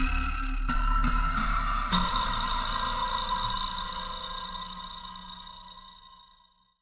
Lo Vibraslap

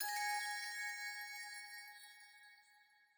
Alchemy Flute Combo

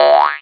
Frog Boing

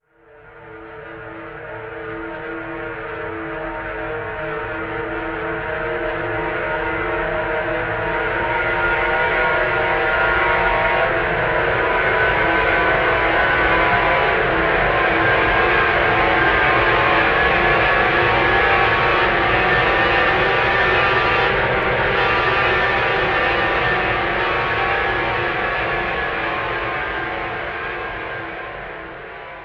Mus Tension Build oneshot

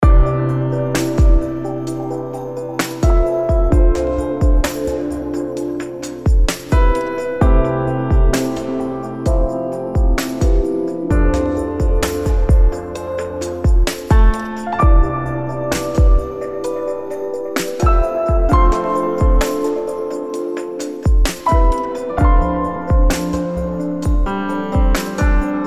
Interface; Resonance Solstice; android

